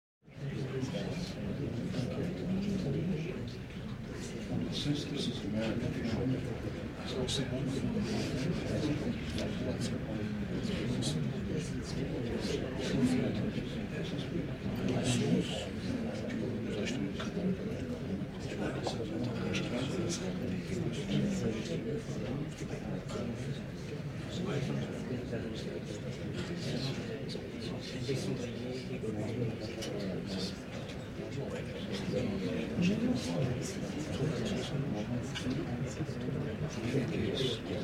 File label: Church Large Crowd Quiet Murmur; Interior Crowds